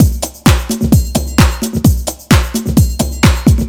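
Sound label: drum loop 130, left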